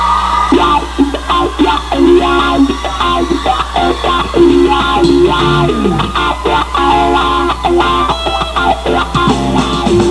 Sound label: gutar